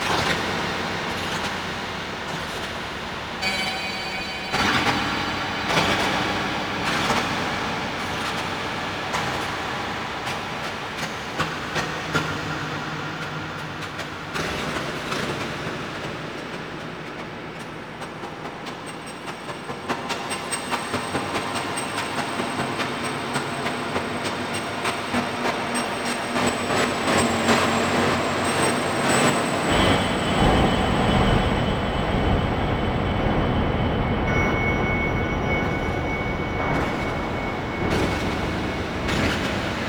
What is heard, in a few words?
Bed Loop; Warframe; Shadowgrapher; Interior